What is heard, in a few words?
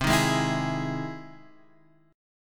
E Augmented Major 7th C